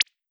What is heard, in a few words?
cute pop